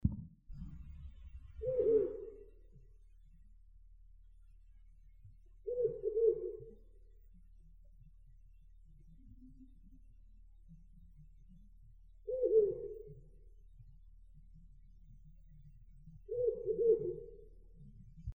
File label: owl